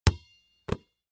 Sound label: Sports Basketball Bounce Catch